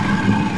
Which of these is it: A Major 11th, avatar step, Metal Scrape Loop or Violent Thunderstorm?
Metal Scrape Loop